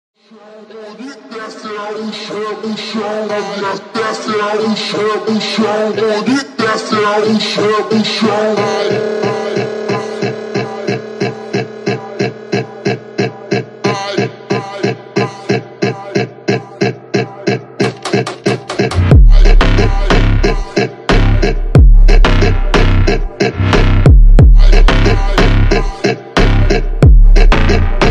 brazillian funk carioca slowed reverb

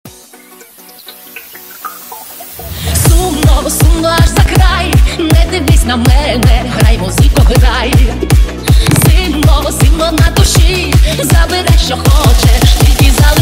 hardstyle remix

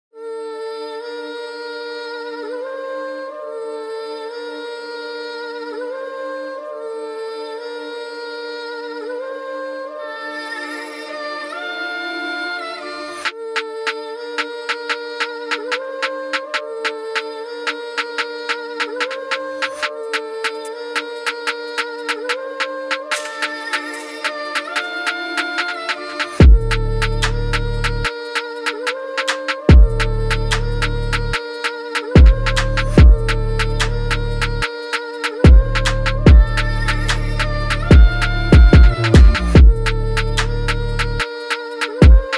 uk drill type beat

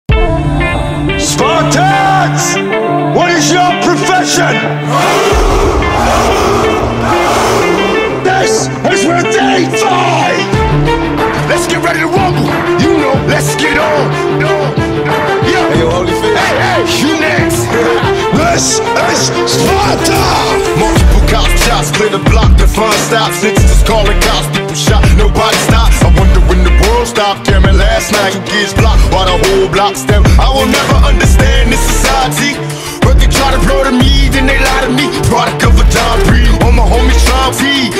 hard gym rap; motivational workout

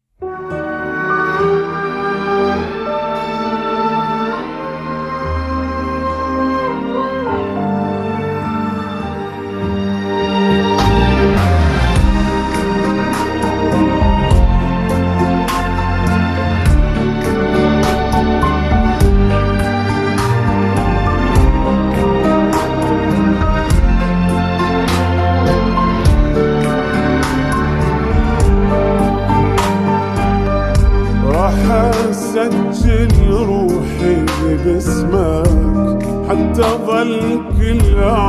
8d audio slowed reverb

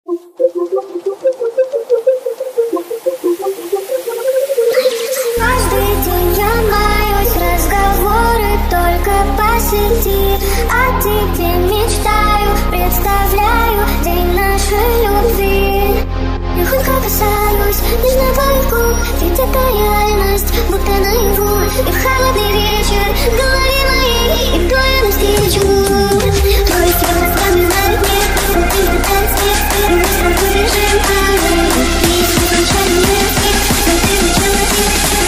hardstyle

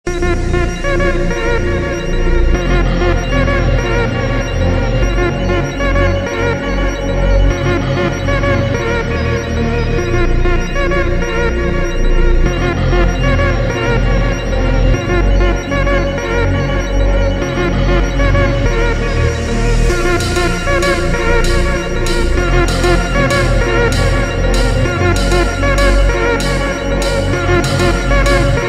ultra slowed reverb

